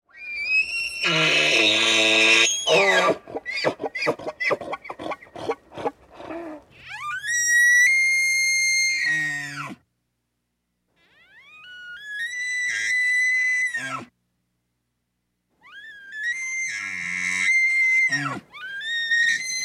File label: Elk; Honks And Squeals, Medium To Close Perspective.